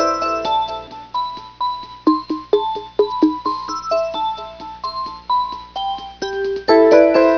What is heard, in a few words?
Music; Arcade; Jetpack